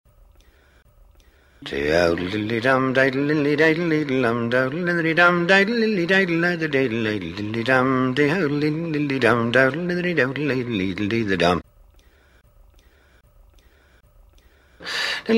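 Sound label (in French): mélopée cajun plus grave; Anti Jingles et Curiosites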